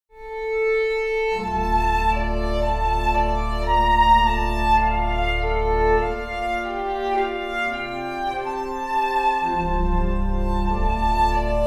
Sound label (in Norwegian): fiolin